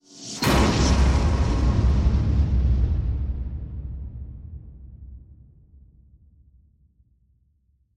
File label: anime explosion metalic